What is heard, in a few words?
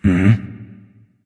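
nonverbal agree; smite; voicepack; ullr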